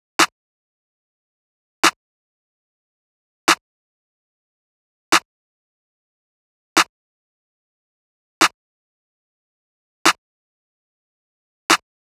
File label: Drum Loop 146 BPM Clap; Loop Stems; Cymatics; Bands Drum Loop; Mystery Pack Vol 4